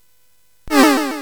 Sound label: Dark Castle, CSG8580R5